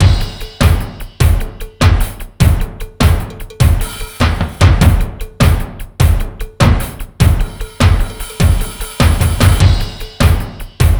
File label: ROCK, Funky Xtreams; INIS